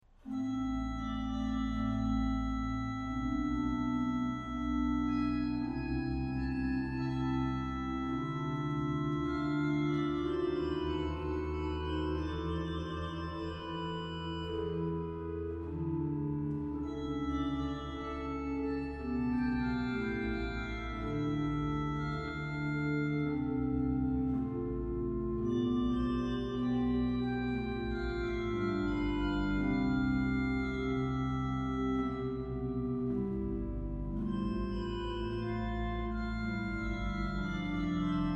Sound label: Largo Concerto Classique, ORGUE